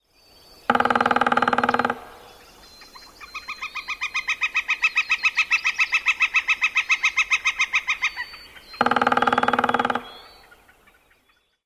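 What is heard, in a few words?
drums & kikikiki yellow-shafted, Northern Flicker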